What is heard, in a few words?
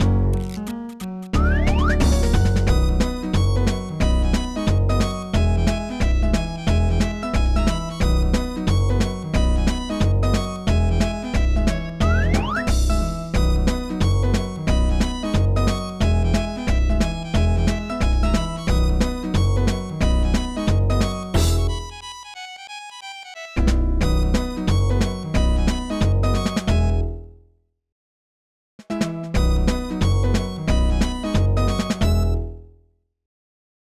BGM Tap; Rhythm Heaven; NDS